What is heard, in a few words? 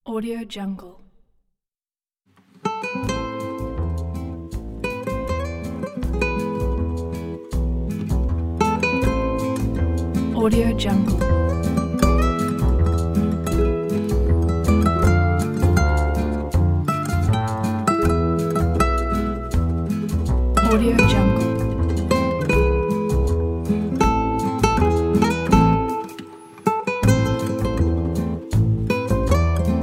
italian pizza tune